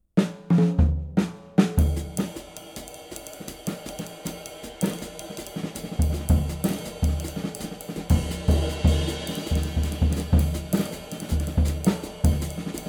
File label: 24 A Zildjian